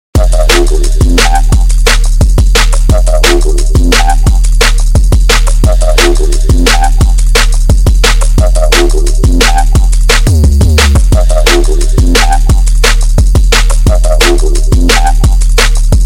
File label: ELECTRIC